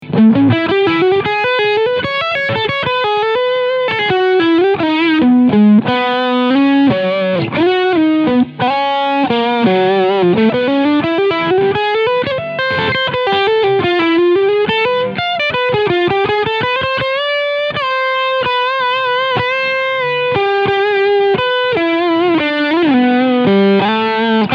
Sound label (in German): Squier Tele Lead Sound Steg PU; 40th Anniv